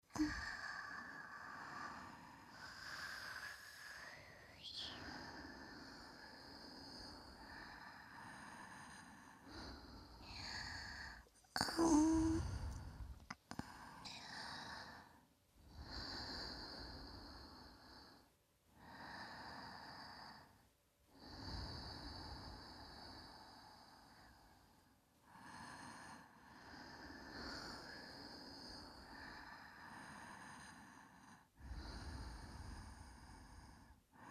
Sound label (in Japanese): 寝息ループ